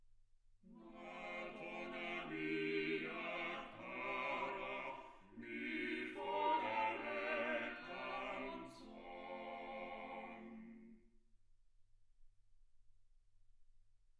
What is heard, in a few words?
quartet